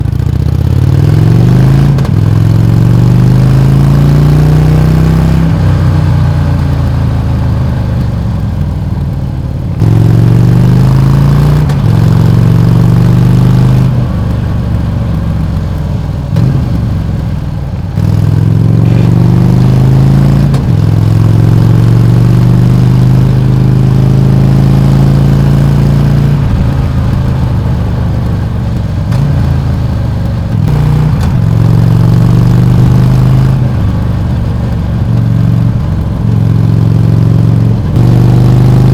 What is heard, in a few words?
XL1200T Stock Sound; HD XL1200T; motos